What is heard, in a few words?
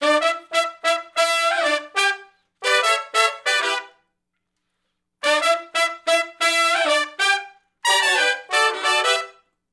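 R&B Riff (Emin) unison to harmony; Sections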